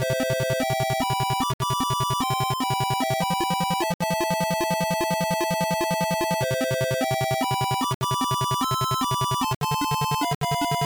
Main Bgm; Megumi Rescue; System E

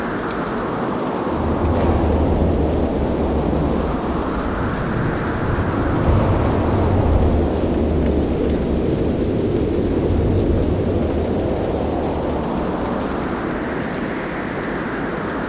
Amb Dojo Storm; Fruit Ninja; Android iOS mobile gamerip 2010

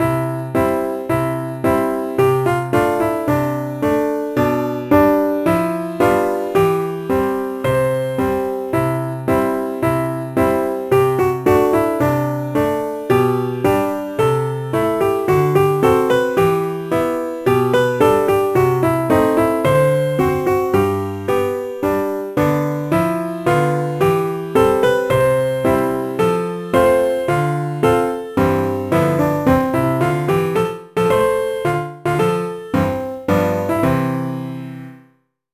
BGM PIANO ALL

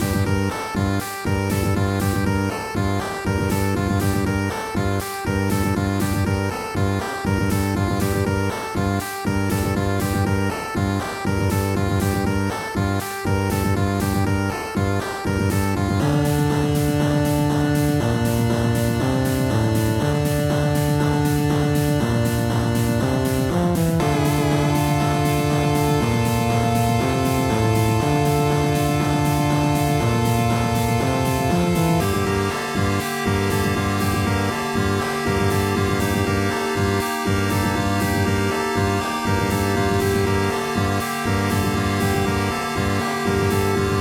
bonus boss

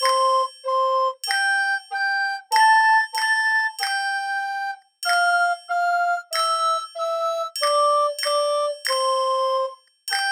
twinkle, twinkle, little star recorder & triangle; Full of Stars Little Planets

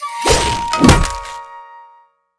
Win; Sushi

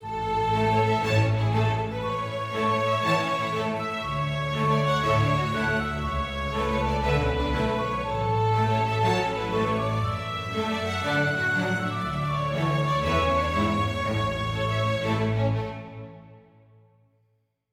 happy forte

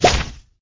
Baddie pop

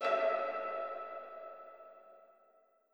chime; chime sharp

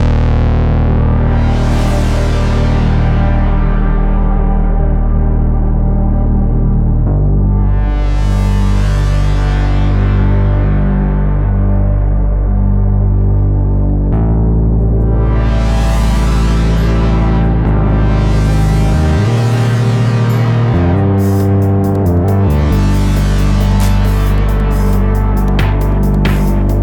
City Ruins Stem; Epitasis

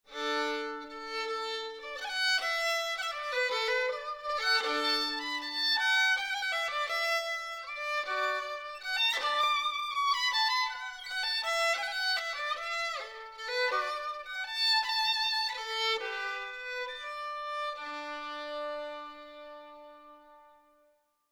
Violin Solo

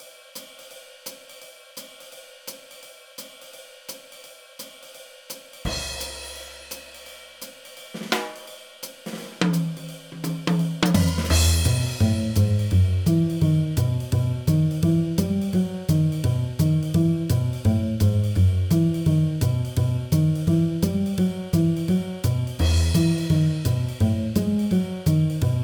Jazz; wii fit u

